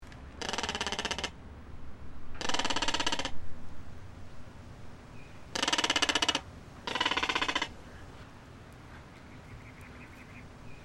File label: caracara